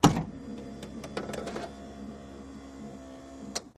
Buick Park Ave 87 Trun; buick park avenue 1987

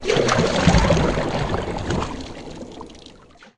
Canoe Paddle Stroke; rowboat & canoe

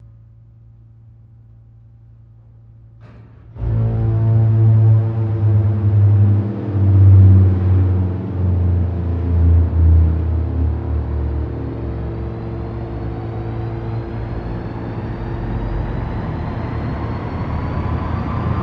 Powerhouse Generator

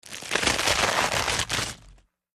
Lettuce Crunchy Rip, kitchens; Lettuce, crunchy rip, mighty

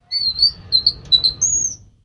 Drawer Metal Open

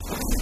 zipper; zippers

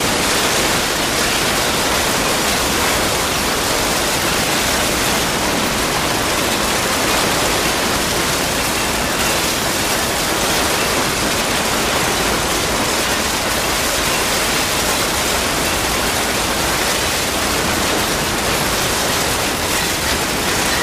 Mass Destruction, demolition

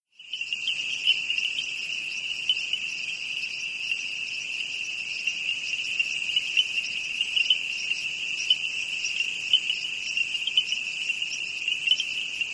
Frogs Peepers

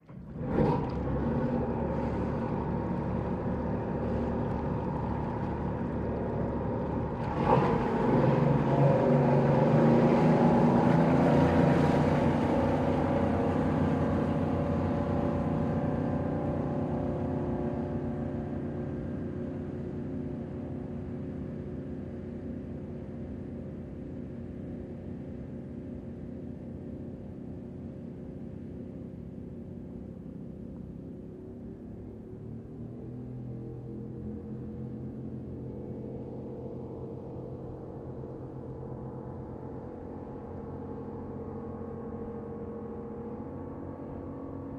Military PBR Startup

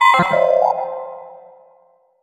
Button Press Beep Conf

